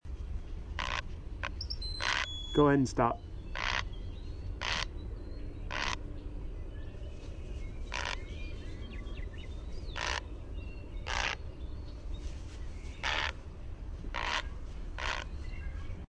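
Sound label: Great Blue Heron call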